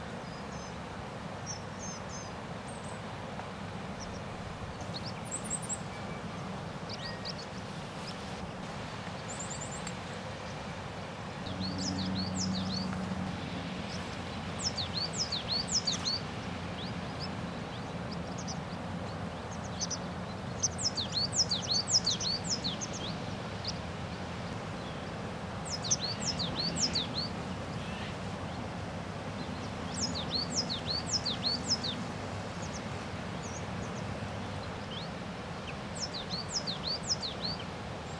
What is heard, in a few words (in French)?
mésange noire chant